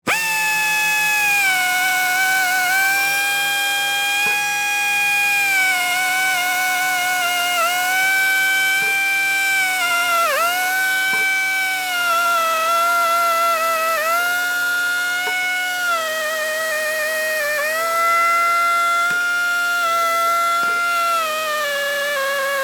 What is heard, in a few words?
Angle Drill Operate, Industry, Angle, Drill, Operate, Drill, Wood; SoundEffects-BlastWave